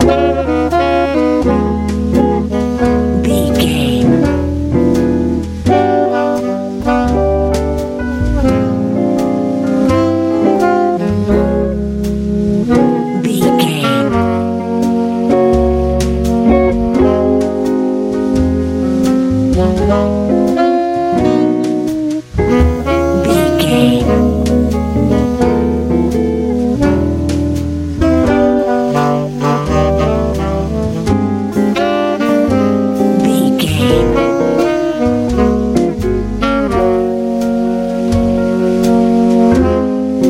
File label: sunny warm smooth jazz